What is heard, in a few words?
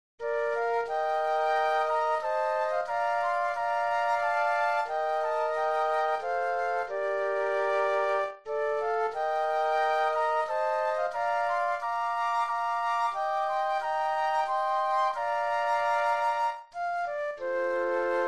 Flute Trio; classical